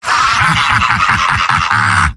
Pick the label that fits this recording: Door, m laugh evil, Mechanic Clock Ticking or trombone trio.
m laugh evil